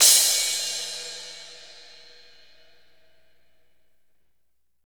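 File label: cymbal crash, fast rock, Roland; Northstar; Drumscapes Roland; Cymbals 1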